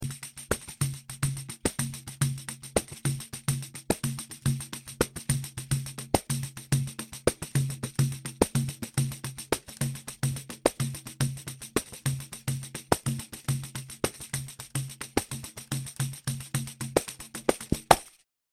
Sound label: pandeiro